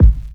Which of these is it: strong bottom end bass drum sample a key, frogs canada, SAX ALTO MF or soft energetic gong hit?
strong bottom end bass drum sample a key